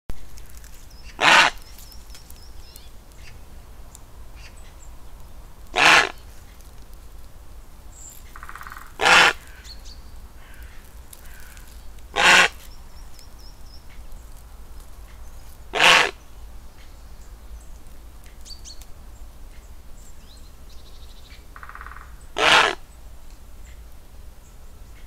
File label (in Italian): verso airone cenerino; fauna